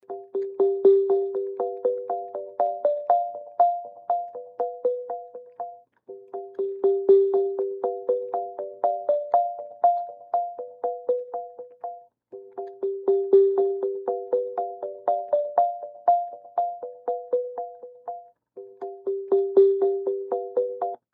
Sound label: Marimba Music